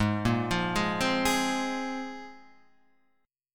A sharp 7th Suspended 2nd G sharp, guitar

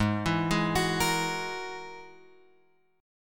G sharp 7th Suspended 2nd Sharp 5th; G#7sus2#5 Chord {4 7 6 X 7 6} arpeggiated

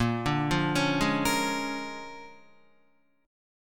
Bb 7th Sharp 9th Bb, Guitar; Bb7#9; arpeggiated; JGuitar

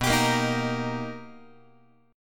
B Augmented Major 7th B Guitar, chord; B+M7; strummed; JGuitar

